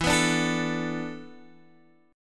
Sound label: E 7th Suspended 4th, Guitar, Open G